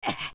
Push; Link pushing a large object (ledge climb #1 sound in higher pitches); Adult Link

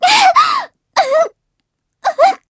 Tornado Struggle; Zelda struggling to stay on her Loftwing; Skyward Sword

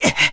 Ledge Grab; Link grabbing onto a ledge

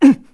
Lift; Link lifting an object

